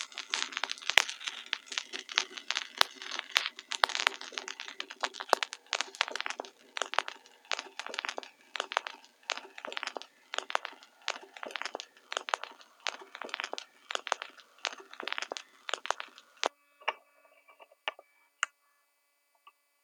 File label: pop end, Vinyl; Cineblur